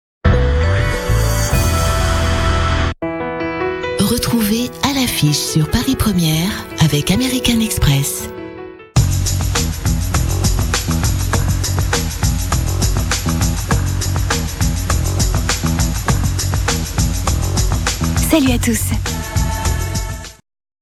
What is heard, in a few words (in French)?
generique; paris1ere; a l affiche